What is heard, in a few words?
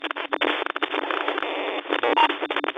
Robotic Noises